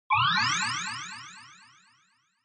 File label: Scene Change Delay Fast